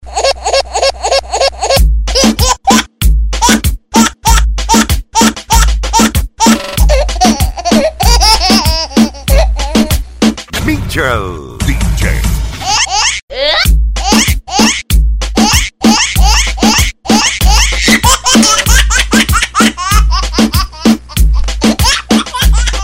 lustiges baby

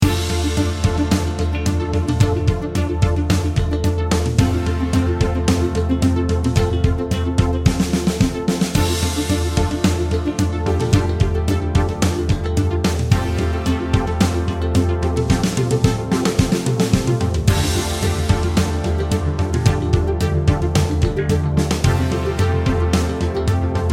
Tanzmusik Cool

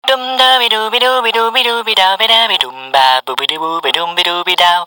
singender frosch

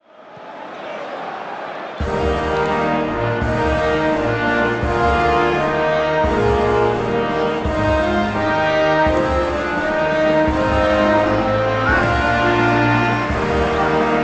deutsche nationalhymne

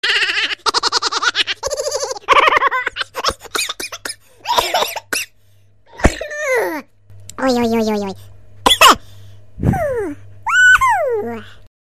lustiges lachen

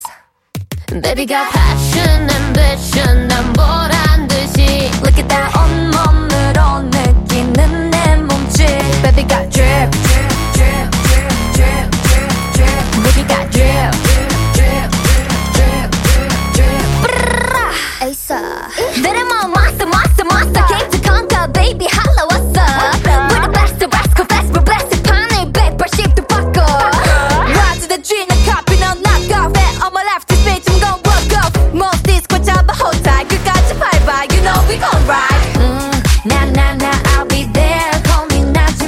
drip, Klingelton

